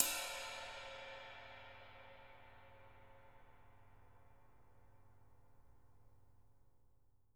JZ RIDES, Living Drums; Sampleheads; Peter Erskine

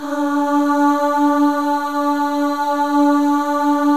Voices, FEM AHS; Spectrasonics; FEM.1 AHS

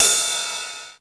909 RIDE, TR-909; Crypton - DrumBase5000

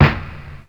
Snare, Ensoniq ASR-X Pro

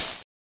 Hat Open; Sonic 3; M8; Genesis

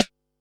snaredrum, Pearl SC-40

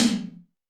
Tom Hi Return; Alesis D4

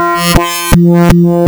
PPG wavetables; waveedit256

